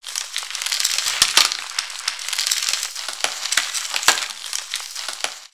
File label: Freeze Wand Crackle